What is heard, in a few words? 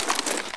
glock draw